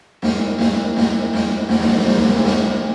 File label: Plate Reverb; EU default